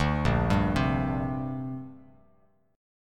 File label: Bb 6th Add 9th, Bass Standard; Bb6add9 arpeggiated